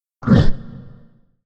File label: Ogre hit; Temple; Arenill